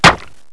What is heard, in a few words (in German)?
KLAPP